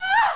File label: pain; sven